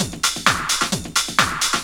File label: drum 130, techno trance drumloops